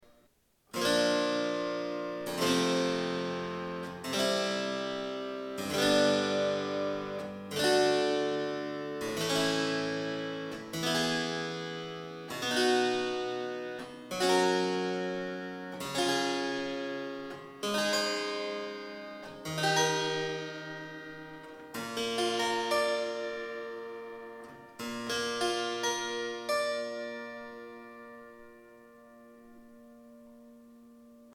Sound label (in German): cembalo